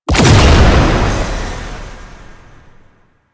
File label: Research Pod Activation C; JadeShadows; Ascension